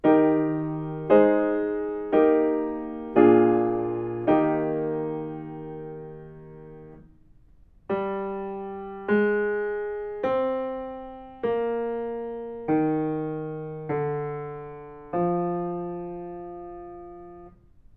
melodic fragments

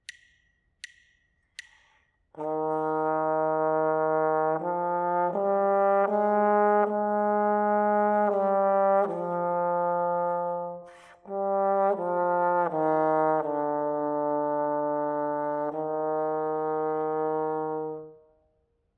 melodic dictation

